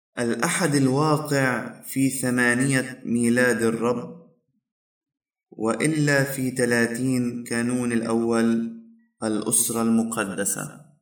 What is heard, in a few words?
Entrance Antiphon; Holy Family